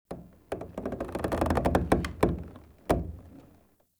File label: Vendor Wood Floor Creak; Ambience; Soul Plane